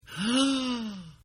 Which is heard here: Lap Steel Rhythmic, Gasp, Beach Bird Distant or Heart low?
Gasp